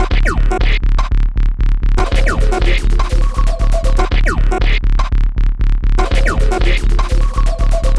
Plug Loop Two, Underground UK House & Electro, Pads; Sonic Foundry (Sony Creative Software)